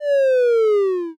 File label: picosleepy